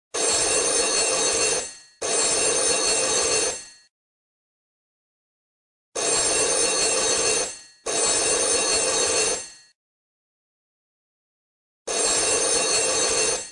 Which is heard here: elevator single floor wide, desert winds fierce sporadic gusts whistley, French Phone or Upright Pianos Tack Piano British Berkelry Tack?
French Phone